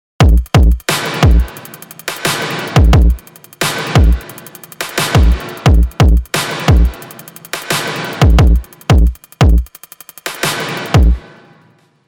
Drum

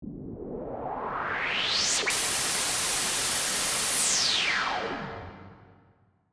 Riser

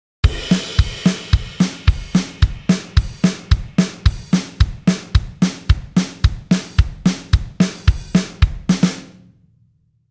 Drum

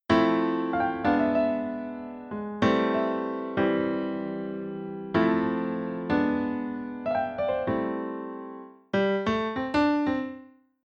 Piano

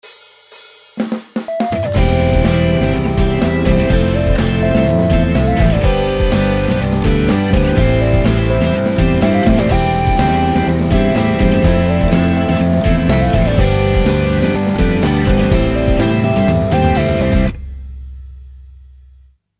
2mix dry

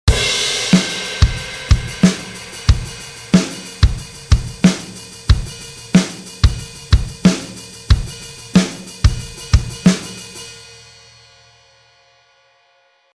Drums

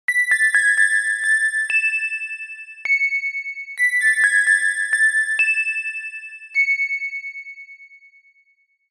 Bell

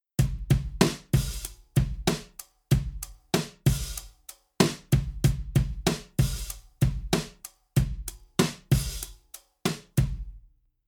Drum

